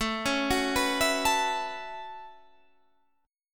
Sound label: A 6th Add 9th A down Guitar Half Step Down